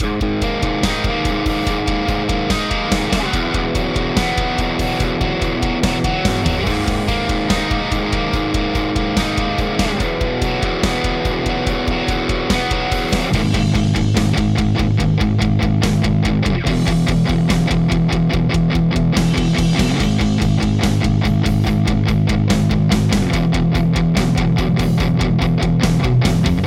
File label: TS7 Hot mode 0 drive 50 tone 50 level; ts shootout